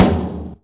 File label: nincompoop, snares real